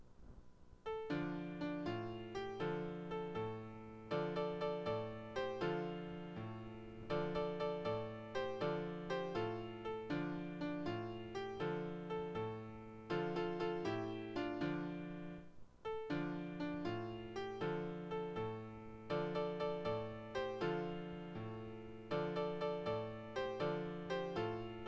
klavier; Seemans Lied

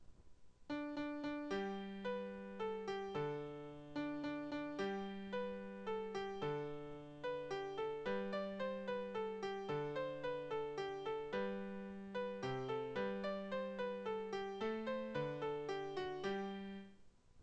klavier; Kleine schoener Land

